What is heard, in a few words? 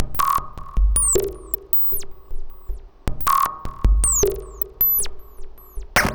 Modular Loop, Modular Percussion